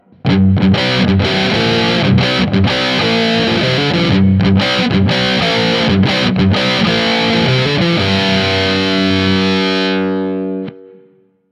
rock, guitar; native instruments; guitar rig

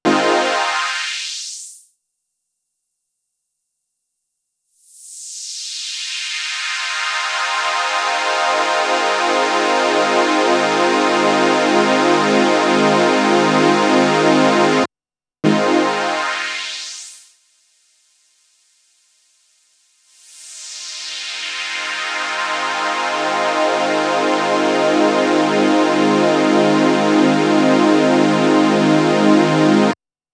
Waldorf Blofeld mideres open close hpf

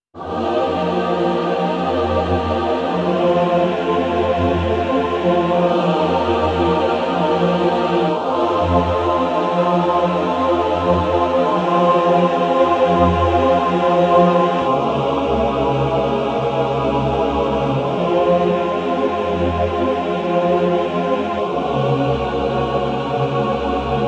choir, waldorf blofeld

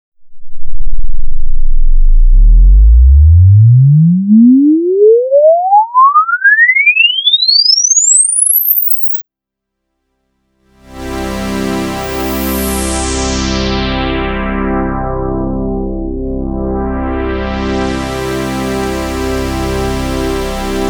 clean; fabfilter volcano2

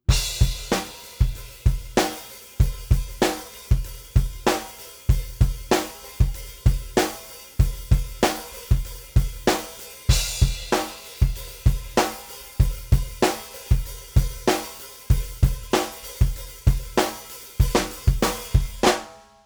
drum dry; elysia mpressor

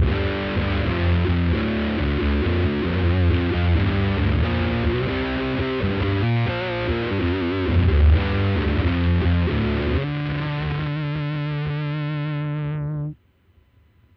arena fuzz; line6 pocketpod

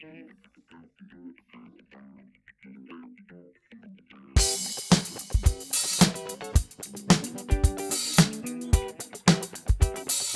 middle clean, gibson sg; sg goddess

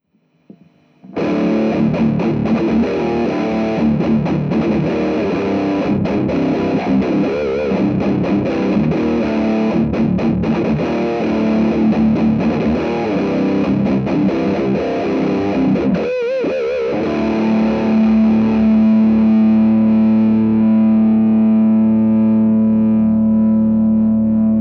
ultra lead; randall; re20; mts

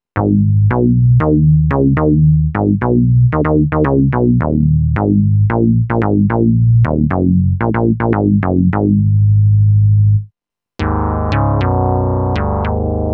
mpc5000 bass; akai mpc5000

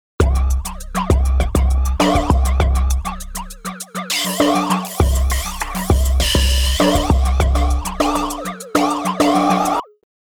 filter; sugar bytes; effectrix

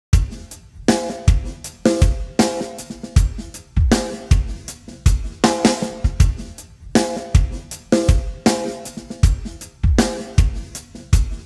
drums, timestretch 133; celemony melodyne

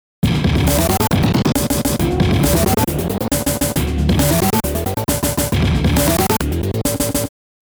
looped, effectrix; sugar bytes

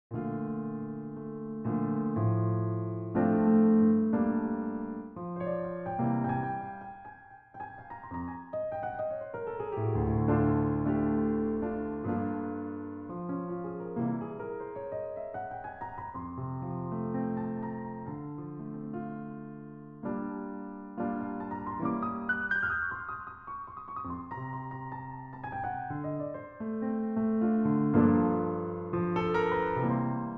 piano; eastwest colossus

